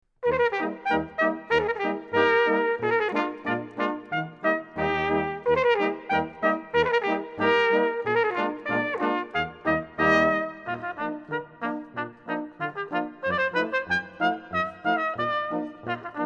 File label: Polka, brass band